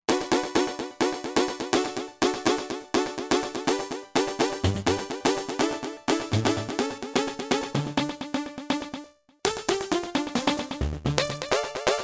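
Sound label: Sequence Ricochet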